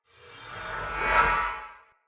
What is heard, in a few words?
Great Sword Whoosh Accent Heavy; Weapons; Player; Mendicant Great Sword